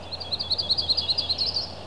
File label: yellow rump; natureNavigator